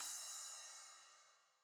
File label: Ground Middle Hit Small; the legend of zelda tears of the kingdom; Field Battle